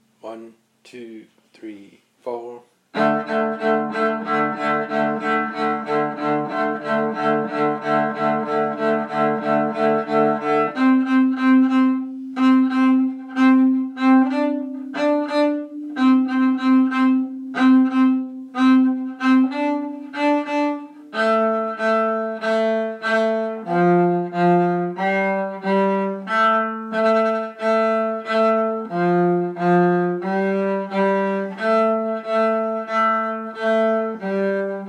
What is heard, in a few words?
explosion cello